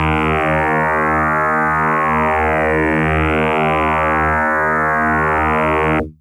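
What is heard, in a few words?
Electric Bass DX100 D#2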